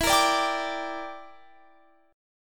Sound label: E Minor 7th Flat 5th E; Em7b5; strummed; x,19,20,19,20,22